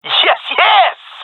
cheer, gendarmerie male; vo; agents

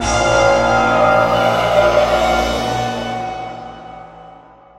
reviver reviving intro, music; Half Life Alyx; hotel

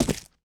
footsteps concrete; player

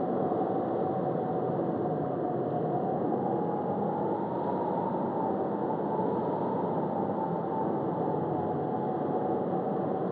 mountain wind lp, ambient atmosphere

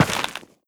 step, footsteps, gravel; player

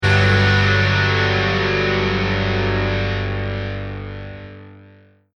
deathcam; music

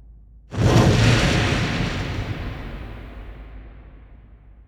ambient fireball flyby; Portal 2

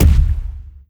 bass soft, zombie blind step; Half Life Alyx; creatures

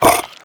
breath in, headcrab black; creatures

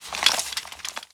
suit, footsteps

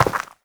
step, footsteps, player, dirt

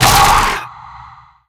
vox shockwave yell, zombie revived

